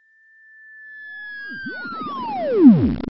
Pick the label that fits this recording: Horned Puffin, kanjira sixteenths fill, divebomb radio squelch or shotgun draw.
divebomb radio squelch